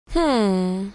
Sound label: hmm, Thought